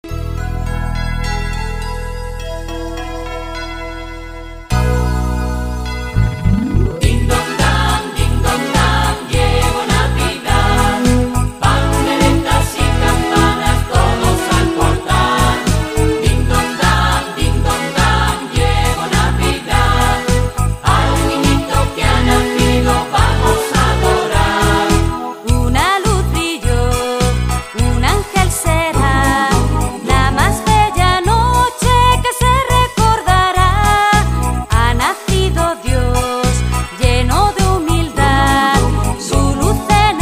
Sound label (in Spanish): popurrí